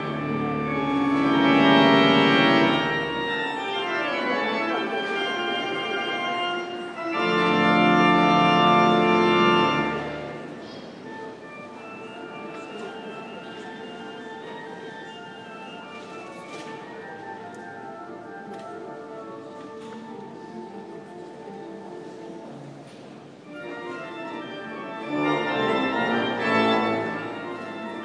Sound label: inner temple church organ